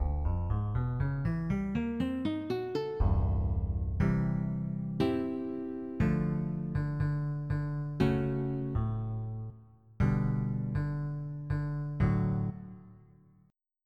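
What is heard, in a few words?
Acoustic Guitar - nylon; FF7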